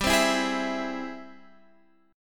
G sharp Augmented Major 7th G; G#+M7/G strummed; JGuitar

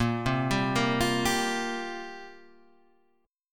F Suspended 2nd Suspended 4th, A sharp

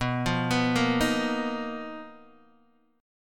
B Major 7th Flat 5th B down Guitar DADGAD

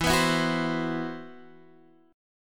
C Major Sharp 11th E

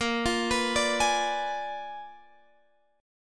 A sharp 7th Flat 9th A sharp

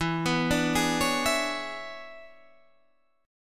E 13th, Guitar, Half Step Down

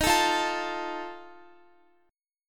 Eb Suspended 2nd Flat 5th, Guitar; Ebsus2b5; strummed

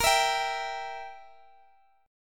E Diminished A sharp

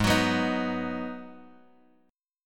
G sharp Minor 7th G sharp, Guitar Drop D

